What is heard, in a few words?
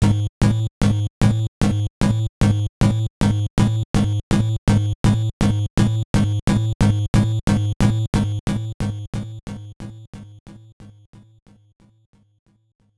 Approaching Boss; Hello Kitty World; nes